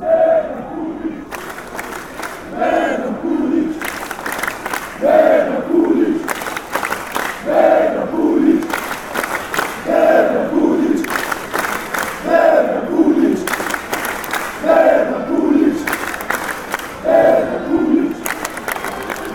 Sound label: bosnia chant